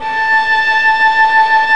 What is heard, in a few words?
STR Violin, Solo Violin FX